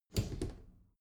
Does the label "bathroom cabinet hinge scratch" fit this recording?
Yes